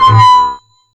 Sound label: OUCH HIT, METAL PERC; Zero-G Ambient 1